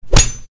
swing strike; Dark Village

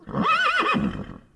unicorn die; Teen Titans Go! - Raven's Rainbow Dreams

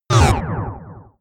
fail; Ben 10 - Alien Catcher

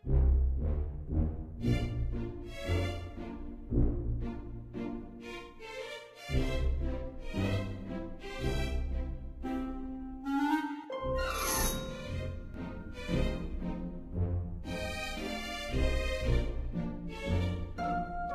music ambient wardrobe loop; run-dorothy

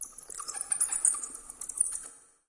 bats; Be Cool Scooby-Doo! - It's Dark Out There; ThrillGames

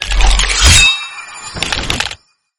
transform; Gotham City Speed

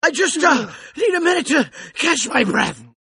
lose; mondo

